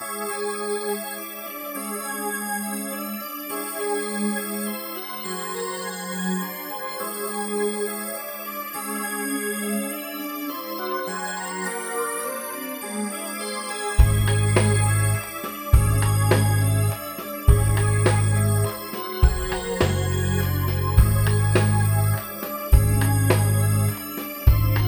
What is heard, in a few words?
Eary feely "walitz of the dead" kinda thing